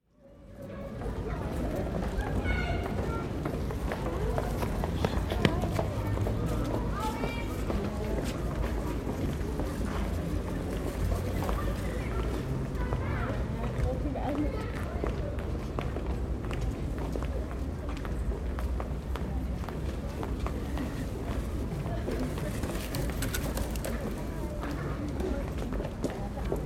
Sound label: Streets, Pedestrianised high street, with passing footsteps, chatter and children; Crowd; BBC